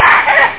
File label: Parrot; forest